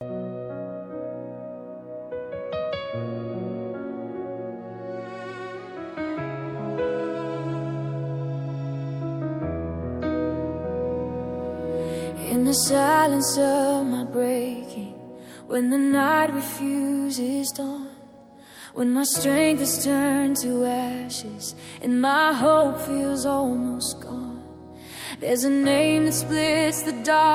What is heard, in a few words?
Worship